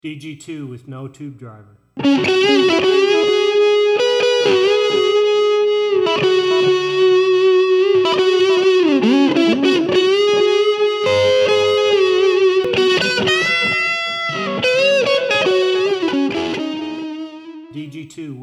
TubeDriver

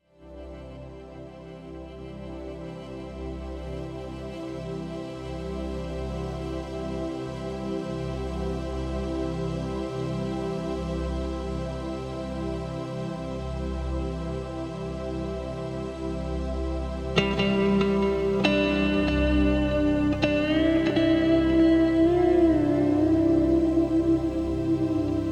intro Pulse Tone, Reeves, Bassman; Dyna; CE2B

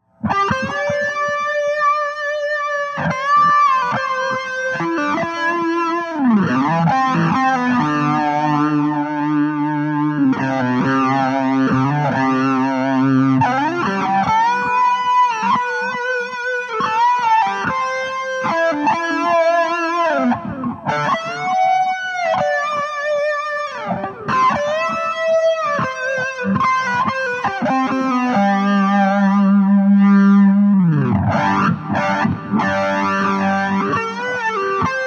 Ram Muff; without backing track

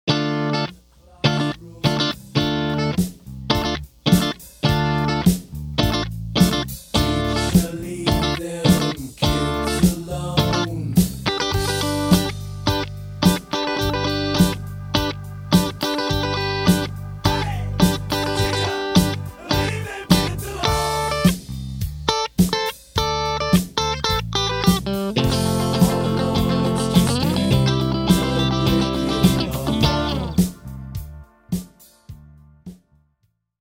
Rhythm Crescendo Dynacomp

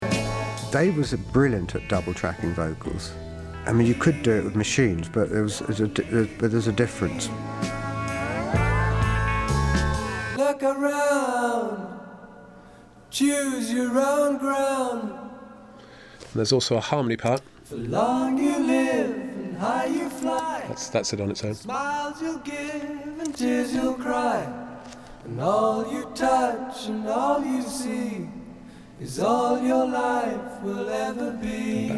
Double Tracked vocals; Classic Albums DVD

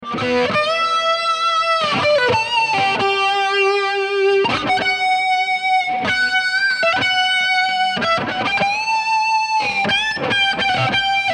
Solo and pinch harmonics

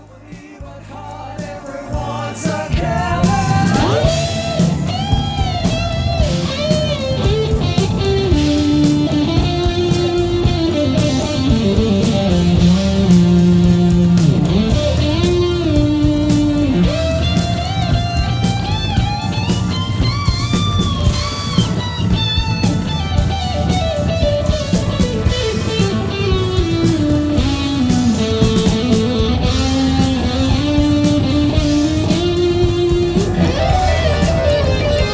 solo Muff; Rvs+RA200

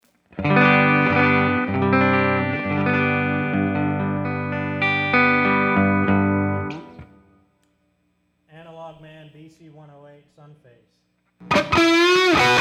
Sunface Lunar Module Helliphant Carbon Copy

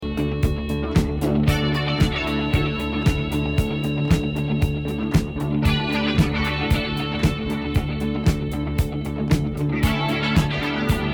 Band Demo Exerpt

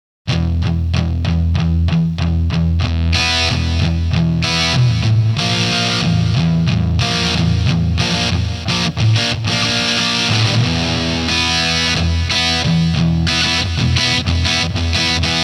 intro Fuzz Face Eclipse; Reeves Eclipse

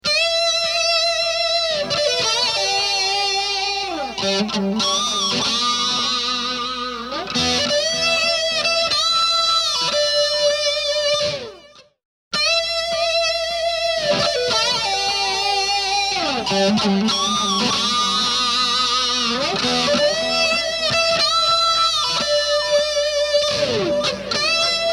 Solo; MZ2 alone compared to MZ2 GE7 Mesa

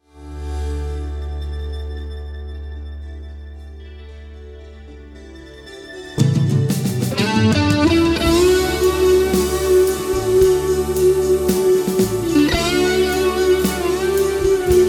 Bassman CE2

